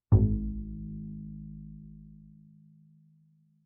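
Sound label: Bass pizzicato fortissimo sul E Ab1, Double Bass; MIS Pitches - 2014; Strings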